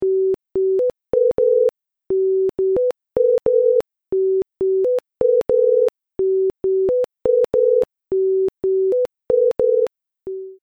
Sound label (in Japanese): 人工キジバト; 鳥の声研究室; キジバト VS 人工キジバト